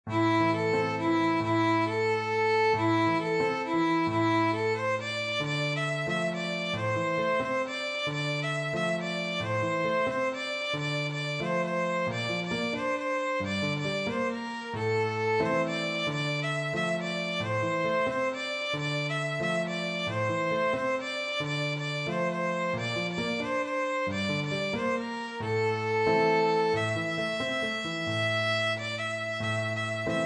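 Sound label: violin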